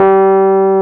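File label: Piano G2 f 73, Rhodes 73 Suitcase; Keyboards of The 60's and 70's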